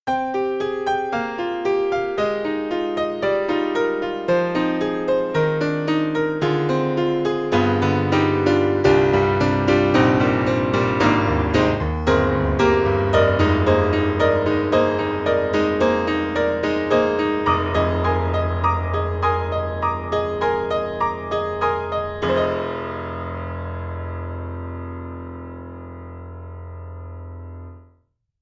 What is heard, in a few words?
Yamaha C7, Rock Hard; Steinberg; The Grand 3